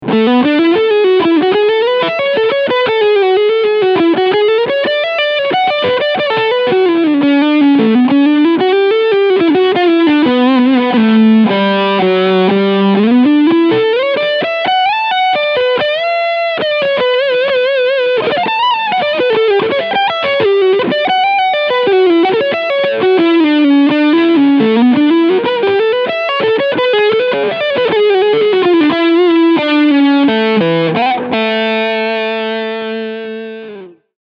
EarthQuaker Devices Hizumitas Sustain FULL Tone FULL Leadsound